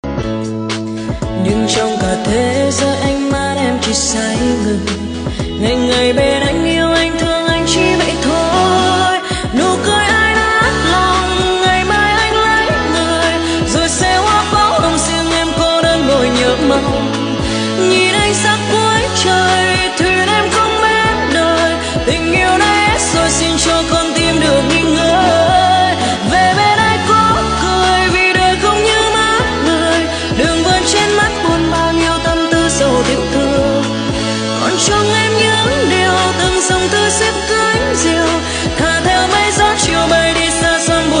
nhac chuong giong nu cover

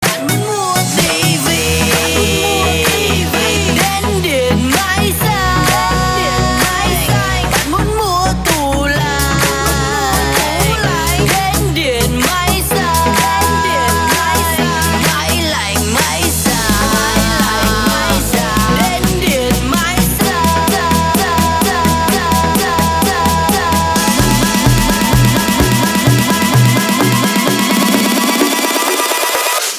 Nhac Chuong Remix

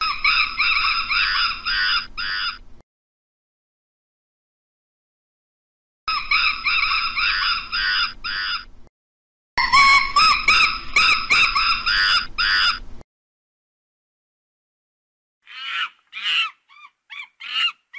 Tieng khi keu

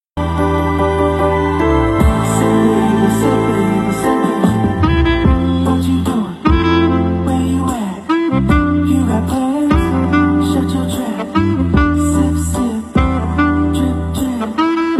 Nhac Chuong Saxophone Ver

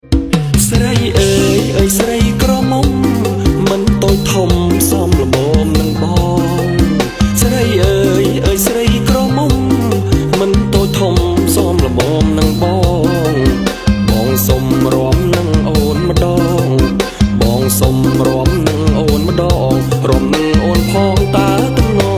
nhac chuong khmer rom vong

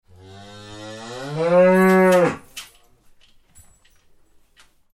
tiếng con bò kêu

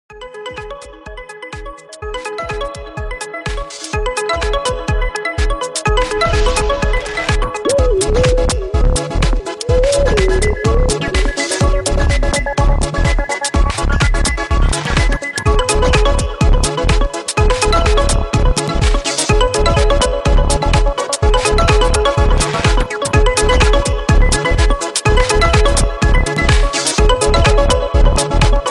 khong loi vivo iqoo 12 pro

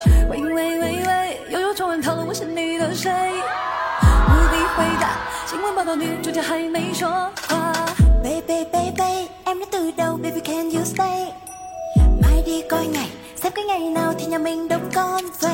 tieng trung

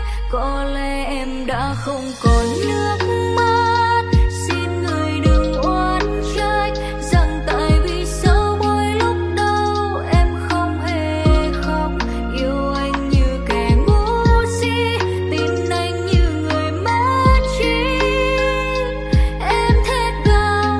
nhac chuong lofi ver

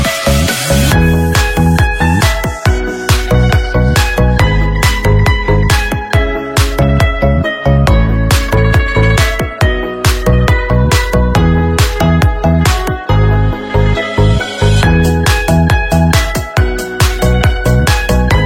Nhac Chuong Remix Khong Loi

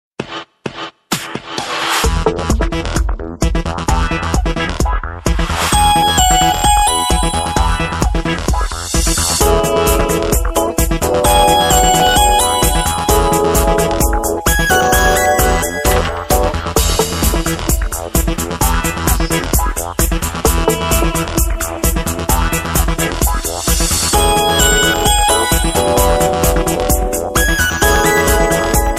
Samsung Độc Nhất, nhạc chuông

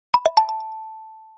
tin nhan xiaomi 5a xylophone notification

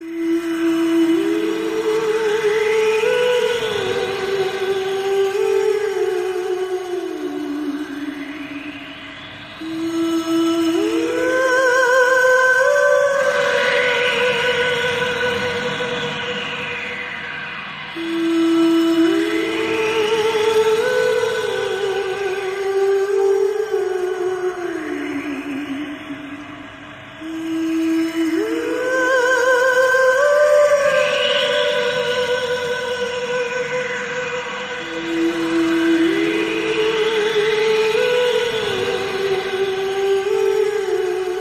Am thanh Ma Quai

